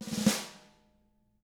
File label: snare roll